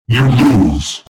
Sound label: whomp whomp whomp